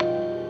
Clack Piano, Drums; BB 2HTC Samples Vol 4

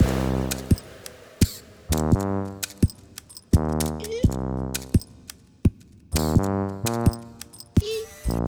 Dampstep, Loops

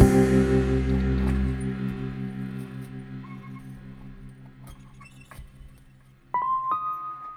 Rhodes Pad; BB 2HTC Samples Vol 4; Pads

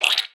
bug, creature

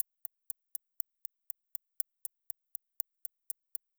Stopwatch; Weird

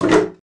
wood misc, wood metal; wood metal SFX

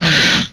Explosion, retro arcade; MMRetroArcadeSoundsPack

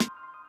Lil Snare, Drums; BB 2HTC Samples Vol 4

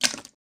slime; water splash slime sfx

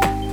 Classic Jazz Snlap; Drums; BB 2HTC Samples Vol 4 Addendum

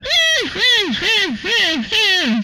Laugh, Vocal; retro arcade

